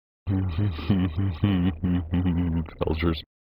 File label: Muffled Laugh; MK2 ARC Unused